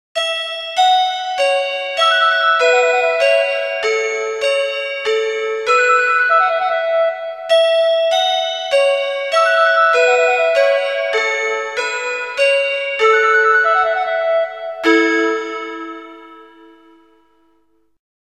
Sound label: chime; denon